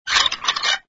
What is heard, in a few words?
Line Squeak; conservation catapult; project green